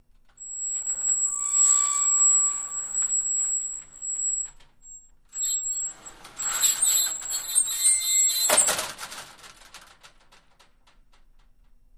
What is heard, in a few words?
Door Accordion Sliding Metal; Double; Open And Close 3x, Very Squeaky, Slow, Medium And Fast; Metal Gate